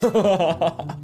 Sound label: stoopid laff